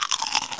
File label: munch